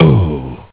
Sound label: PAIN